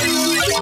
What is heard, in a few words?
weeee